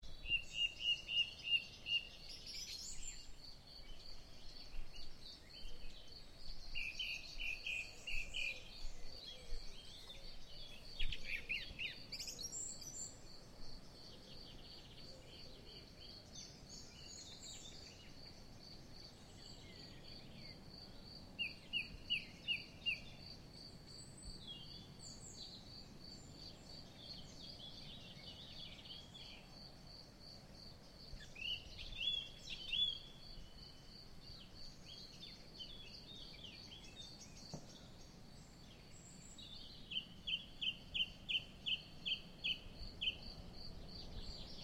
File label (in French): chemin des gatines 21h30; 23 mai 2009